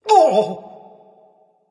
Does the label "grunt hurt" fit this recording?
Yes